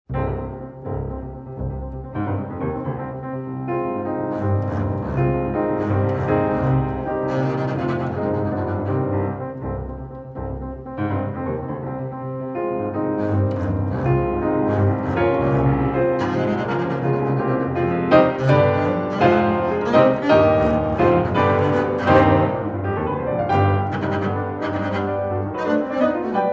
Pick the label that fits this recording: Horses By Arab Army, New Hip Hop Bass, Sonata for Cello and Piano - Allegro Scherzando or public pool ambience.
Sonata for Cello and Piano - Allegro Scherzando